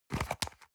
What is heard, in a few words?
paper box small handle hard